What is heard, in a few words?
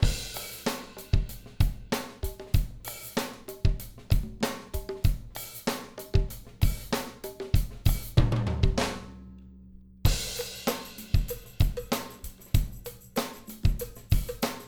Drum Buss Off Drum Bus; Compactor